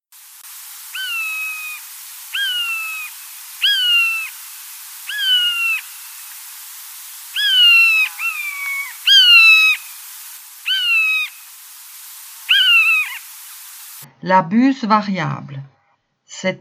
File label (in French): Buse, oiseau; La buse variable